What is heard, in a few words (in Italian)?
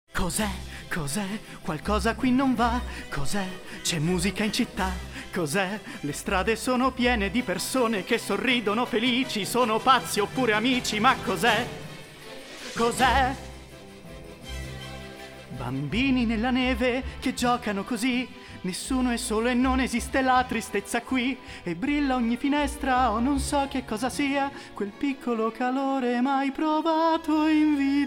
canto cartoon